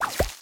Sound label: Disappear Small